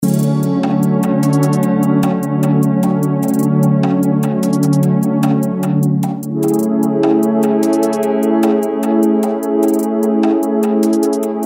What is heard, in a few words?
Lo Fi Elements